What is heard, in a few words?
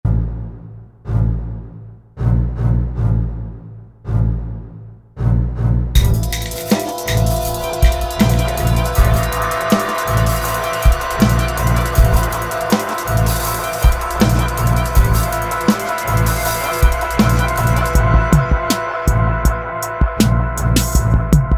Resonator